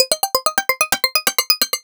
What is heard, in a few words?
Synth Arpeggio, Synths; Eclectic Electronica